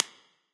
Clap; smite; bakasura v2 skin8

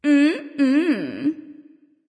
nv disagree; smite; awilix

